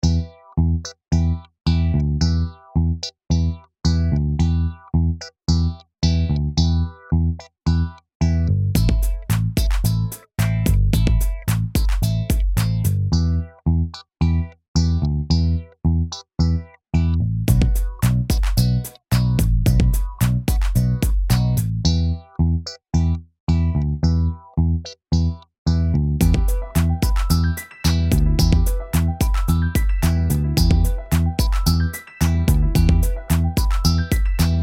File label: e minor 12 bar beat